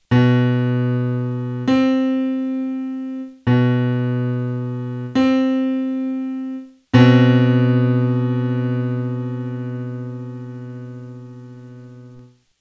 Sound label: Not Octaves, Pitch; Music by the Numbers